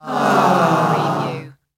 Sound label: Crowd Aww